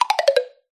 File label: cartoon wood taps knocks descending; zapsplat